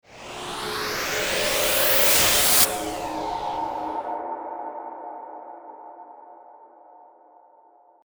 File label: Synthetic Swell